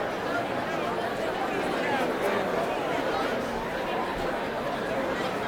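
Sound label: Boxing Crowd Small Ambience Loop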